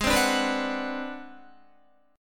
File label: G sharp 7th Sharp 9th Flat 5th, Guitar; G#7#9b5; strummed; 15,15,14,16,14,14; 4F,4A,5C,5G,5C,6E